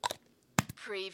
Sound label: Put plastic lid on spray can